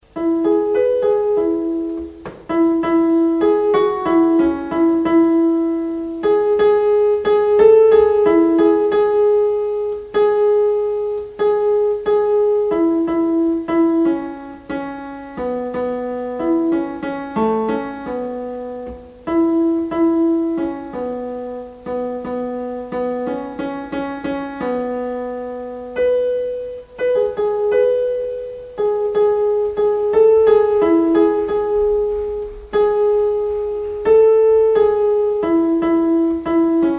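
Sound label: alt instrumental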